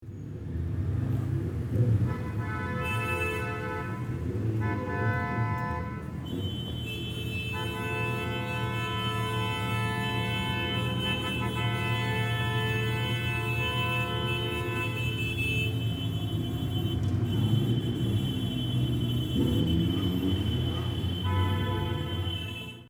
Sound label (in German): Silvester Hupen Menschen